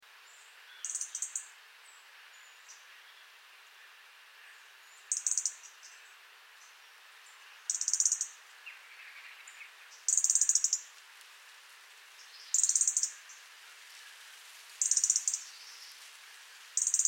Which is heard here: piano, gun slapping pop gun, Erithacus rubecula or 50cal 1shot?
Erithacus rubecula